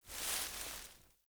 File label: Surface Body Roll Vegetation; Player; Surface Body Impacts